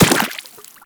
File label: Run Water, Footsteps; Warframe; Teshin